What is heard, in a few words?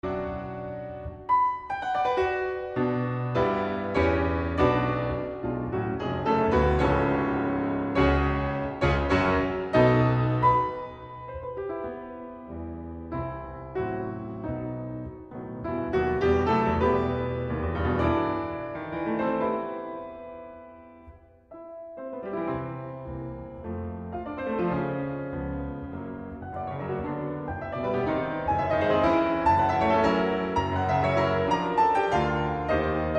Piano Sonata in B Minor, Allegro Maestoso